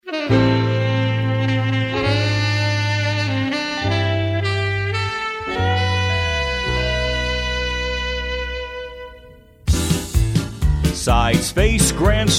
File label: vocal